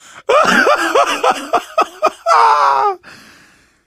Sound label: laughter, survivor voice; source engine; left 4 dead 2; manager